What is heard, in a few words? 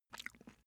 cat eat single